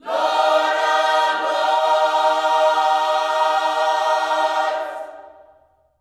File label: LORDS, Gospel; Spectrasonics; Vocal Planet; HALLELUJAH